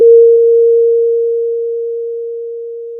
pop, Sound of a church bell

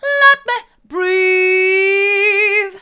let me breathe hard, vocal

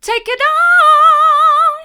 take in on hard, vocal

